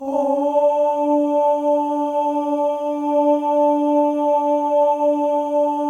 male ohs; Spectrasonics; Symphony of Voices

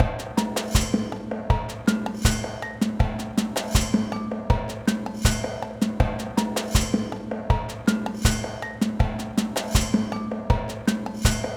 junkyard rhythms, drums

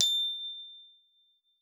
A5, GLOCKENSP S; Xsample VOL-4

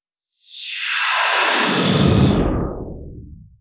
Misc Short FX

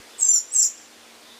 Birds Various

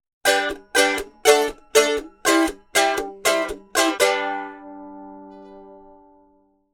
Mandolin Chuffs; Appalachian; Continental Drift Aftershocks

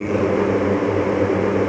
G1, Extended Classical Choir; Best Service

